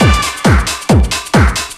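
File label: drum loop 135; House; Best Service ProSamples vol.10 - House; AKAI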